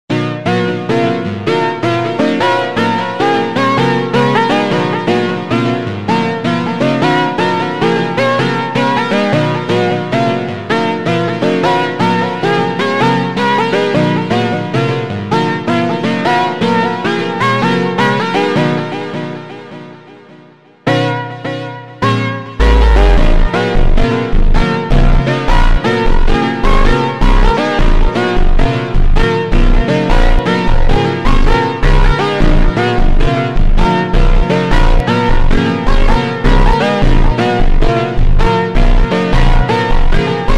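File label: instrumental Ultra Slowed, phonk music; funk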